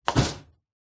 office metal cabinet door close

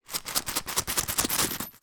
wallet with coins inside shake; foley

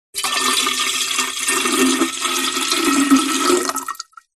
foley liquid water pour into metal canister

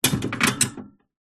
animals mouse cage metal cage hatch open

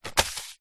seeds packet black chia set down on wood counter; food

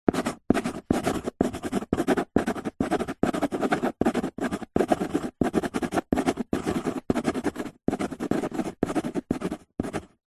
handwriting pencil write on cardboard box; office

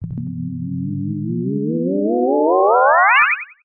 synth theremin robot 2 tone power up; Jessey Drake

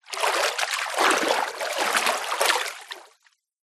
nature water movement gentle

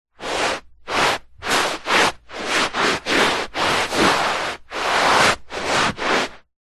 foley footstep foot in socks scuffs on carpet; zapsplat

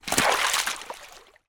foley footstep in water containing broken ice

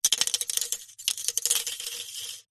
can soda empty ring pull shake inside; foley; zapsplat

